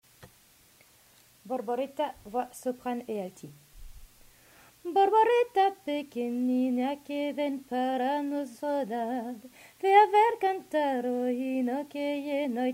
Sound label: Borboleta Soprane Alti